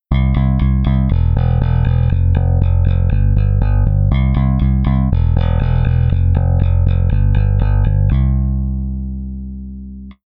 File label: bass pickup single